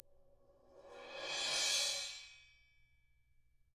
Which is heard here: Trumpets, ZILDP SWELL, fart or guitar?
ZILDP SWELL